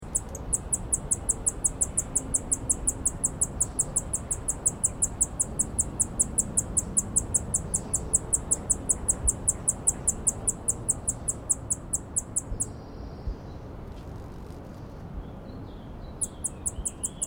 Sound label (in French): ariane beryl, chant